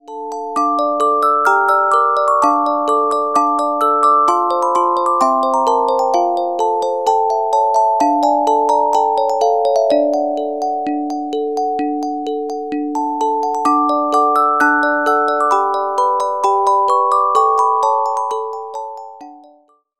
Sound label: Carillon Music Box Melodies